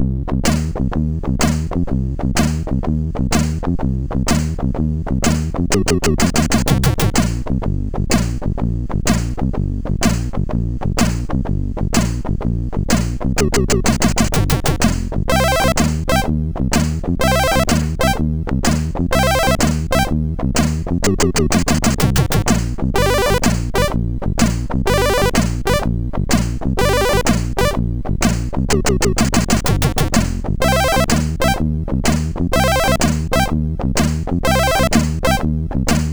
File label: sid, MOS6581R4; 1st Chaff